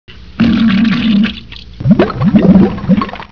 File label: tub fart